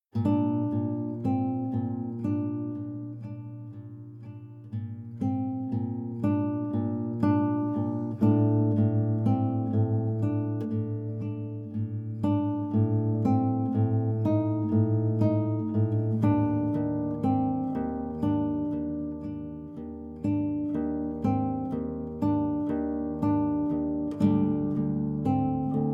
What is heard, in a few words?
Savannah Music